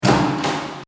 WC Eingangstuer oeffnen

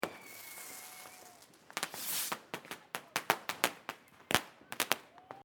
Silvester Atmosphaere

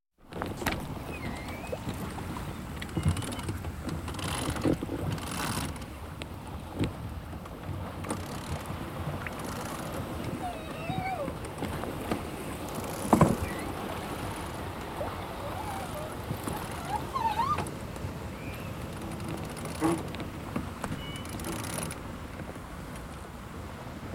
Sport Segel Hissen

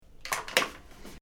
zahnbuerste ablegen

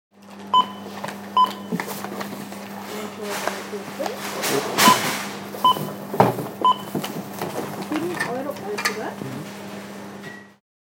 Supermarkt Kasse

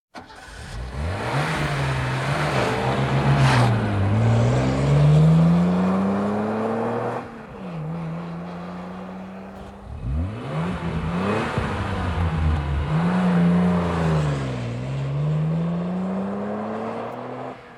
Sport Rennwagen Zwei Schnellstart

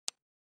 Schalter klein

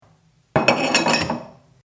Glaeser

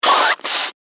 schnaeutzen

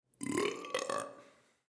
Ruelps

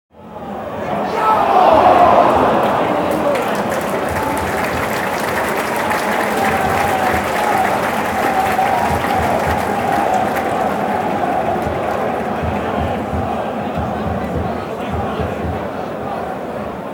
Stadion fast ein Tor

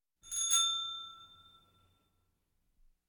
Zweiraeder Fahrradklingel 1 X

